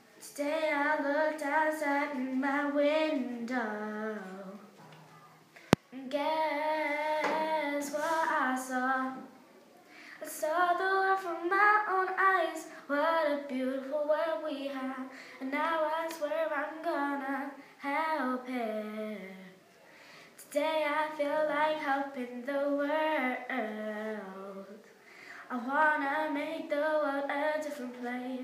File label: Song